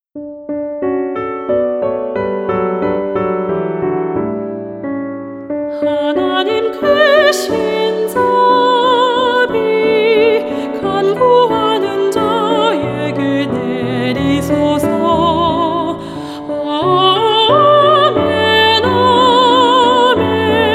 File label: SOPRANO